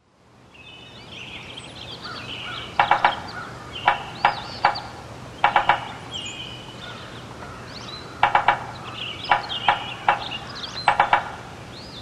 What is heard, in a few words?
Woodpecker